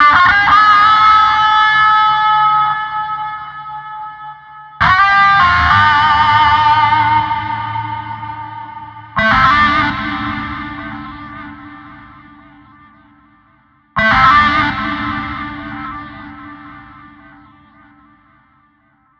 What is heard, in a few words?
Blues Electric Guitar Wails Dm 100